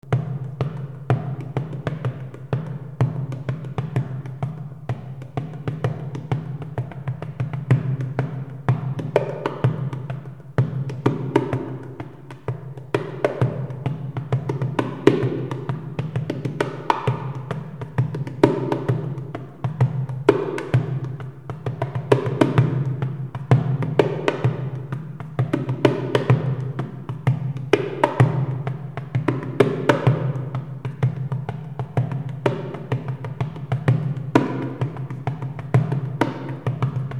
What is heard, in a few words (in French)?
percussion 3 temps rythme